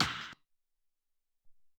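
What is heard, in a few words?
SR16 Stick, Alesis SR-18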